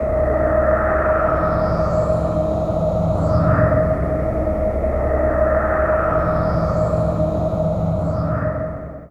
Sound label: dark hall ambience, Drum N Bass; Sonic Foundry (Sony Creative Software); Knutrix Drum & Bass